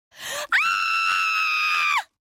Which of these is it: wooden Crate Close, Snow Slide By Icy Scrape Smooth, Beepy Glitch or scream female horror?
scream female horror